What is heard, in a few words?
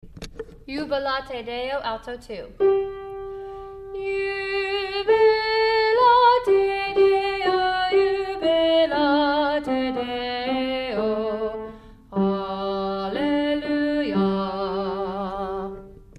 alto